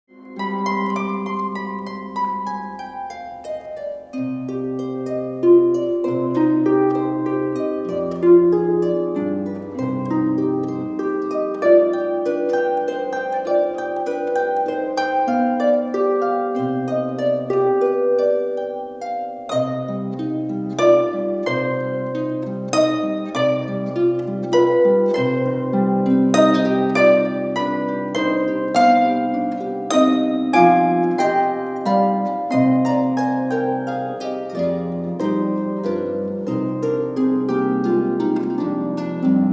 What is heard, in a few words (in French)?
Sonate do mineur Andantino